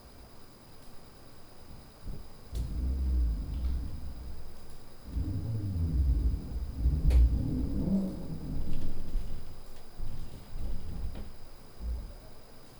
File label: Drill